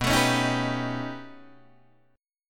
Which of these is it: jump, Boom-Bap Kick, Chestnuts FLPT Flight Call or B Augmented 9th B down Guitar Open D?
B Augmented 9th B down Guitar Open D